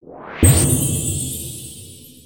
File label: special whip by; aronwk collection; ndaudio